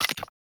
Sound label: Weapon Timer Complete; Warframe; Caliber Chicks Minigame; Pick Ups